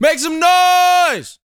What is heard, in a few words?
VOCAL, R&B Dance, HIP-HOP